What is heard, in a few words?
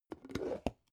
plastic bowl open long